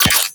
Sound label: Pistol Projectile Attach; Guandao